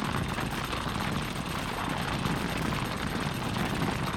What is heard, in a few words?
burner mining drill; factorio 2.0.55